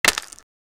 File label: the sound for brick, arcade